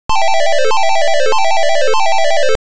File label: win